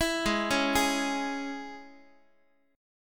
Ab Suspended 4th Sharp 5th, Guitar; arpeggiated; JGuitar

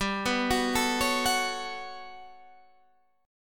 G Major Sharp 11th G, Guitar Standard; arpeggiated; JGuitar

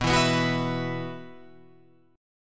B Suspended 4th, Guitar, Open F; strummed; JGuitar

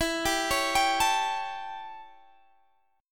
A 7th E, chord

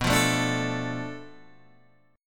B Minor 7th Flat 5th B, Guitar Open G

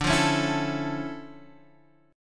D Minor Major 9th D, guitar; DmM9 strummed; x,x,x,7,5,8,6,6

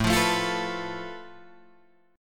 A Suspended 2nd Sharp 5th, Guitar, Drop D

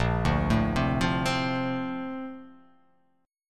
A sharp Major 9th

